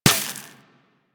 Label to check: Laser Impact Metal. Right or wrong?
Right